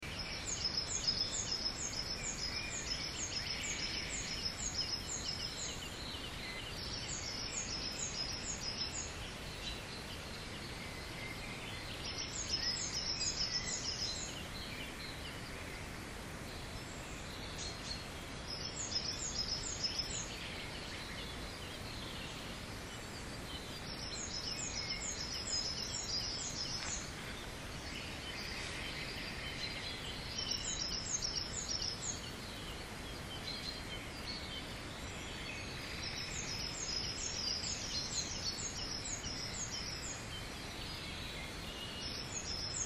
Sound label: birdsong on summer evening